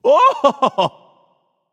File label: laugh; danzaburou